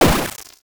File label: sfx exp short hard